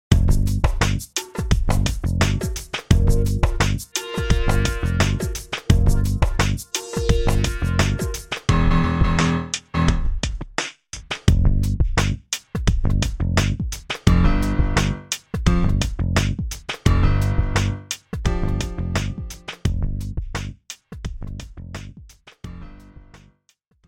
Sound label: groove